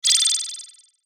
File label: Sweeper High Chopper; Production Element Imaging Element Accent Transition; Sweeper Sound Effects